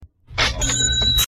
Ka Ching

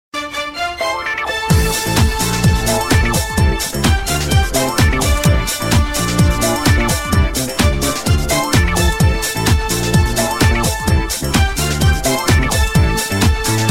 Vodafone Super Tone

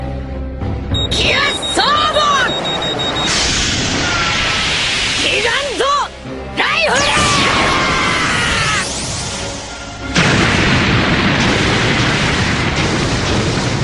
Rifle; Giganto

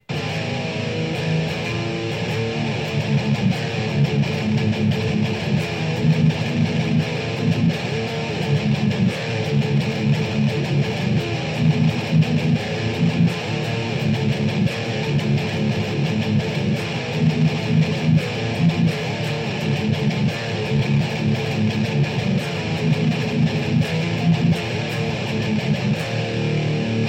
Metal ringtone

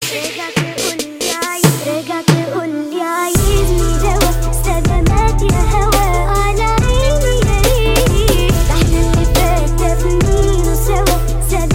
Arabic Remix

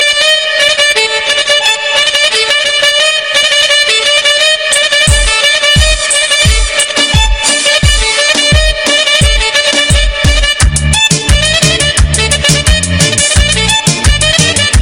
Very Cool Ringtone

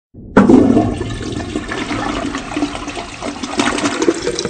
Flush Sms

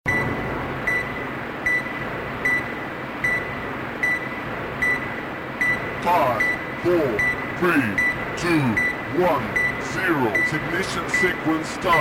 Cosmic Countdown

